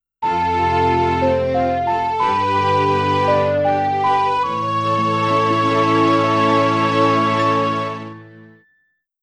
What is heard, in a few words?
Ocarina Acquired